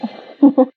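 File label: undefined laugh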